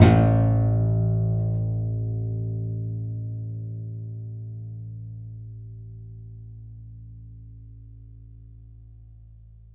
C. Bass Balalaika, Plucked Strings

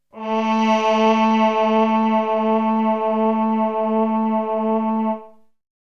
Synth; ZERO G - Datafile DISC 1

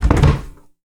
Draw, household rhythm samples Hits

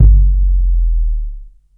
bass drum deep, techno trance drums

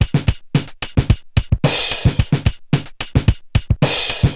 Korg MR-16 (110BPM), beats

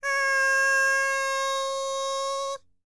BULGARIAN, World Voices, BALKAN; ILIO; Vocal Planet

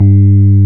PNO, Rhodes Mk.V; Keyboards of The 60's and 70's

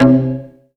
WOODEN C3; Best Service Dream Experience

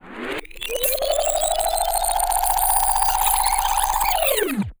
TAPE FF; ENVIRN-AMB

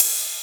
Rides, Cymbals

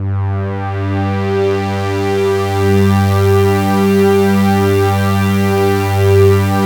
analog swell; Sound Gallery - Charged

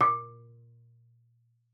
marimba A#2; Xsample VOL-4

